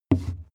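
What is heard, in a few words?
wood step stairs, footsteps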